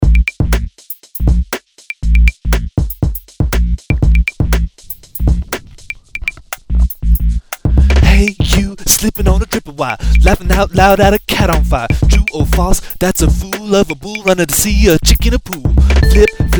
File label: allegro rap